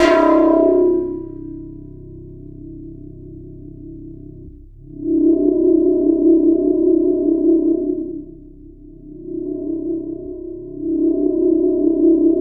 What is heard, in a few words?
C1, SynthLine; 90 s Sample CDs; NiceBeats; SynthLine VOL-16